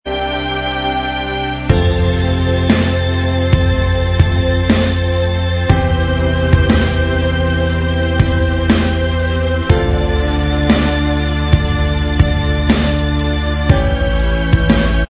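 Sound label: instrumental track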